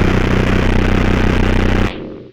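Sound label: RUMBLE left, Hard & Loud Techno; USB Soundscan vol.01; AKAI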